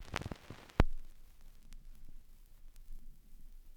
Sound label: CRCK, Production Effects; XXL 800 Production Effects